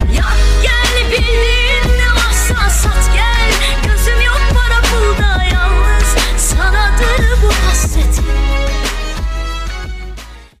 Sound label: remix